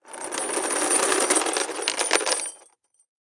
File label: Foley Chain Drag Scrape Movement